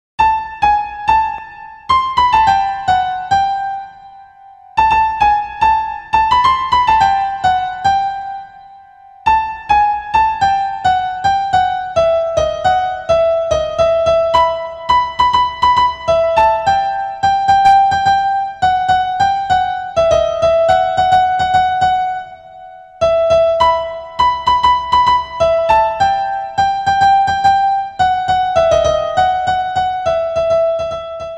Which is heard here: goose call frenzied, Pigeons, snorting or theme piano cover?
theme piano cover